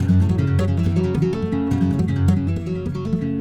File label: Fast N Loose, Acoustic String; Sonic Foundry (Sony Creative Software); Friendly Fire